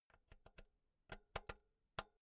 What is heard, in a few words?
insert jack cable slow